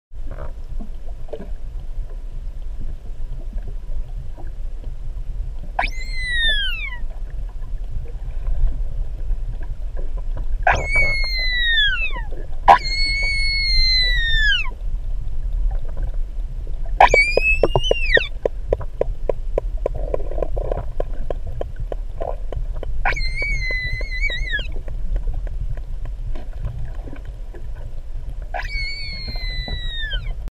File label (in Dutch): Griend; Zeedieren